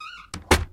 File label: door close; homedecor modpack; homedecor doors and gates